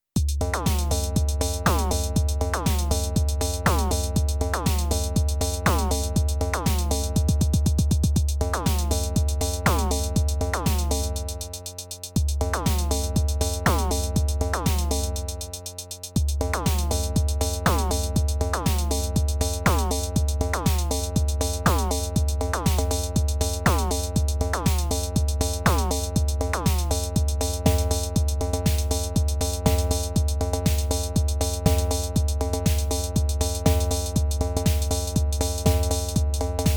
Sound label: drumbrute impact